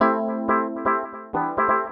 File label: house synth 125 A, keys